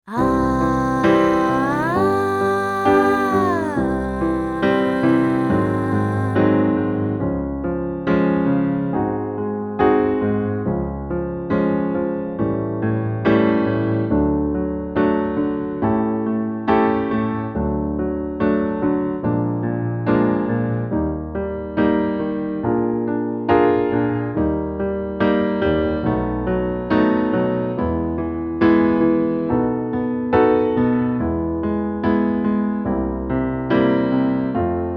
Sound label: Vocal Warm Ups Slide To A Fifth; Vocal Warm Ups Volume 1